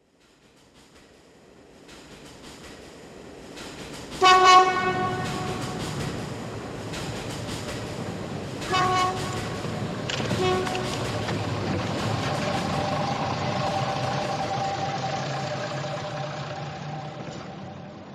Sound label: railcar sound effect; myro the musical